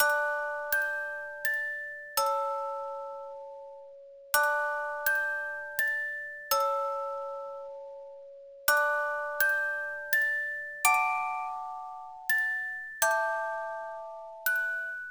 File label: BGM Lion Memory; Irodzuki Tingle no Koi no Balloon Trip; NDS